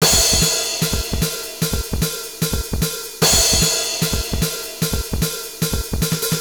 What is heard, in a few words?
drum loop